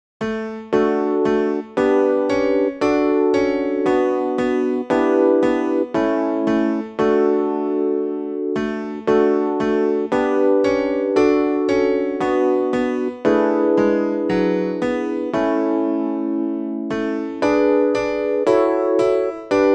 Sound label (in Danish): tenor; Aftensang